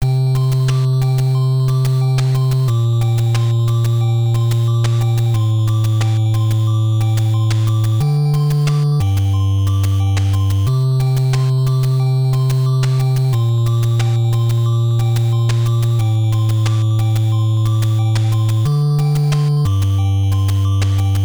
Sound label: RPG Mode End